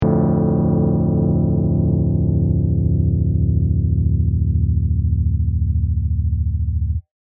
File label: Low Minor Chord